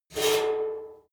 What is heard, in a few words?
metal squeal saturated short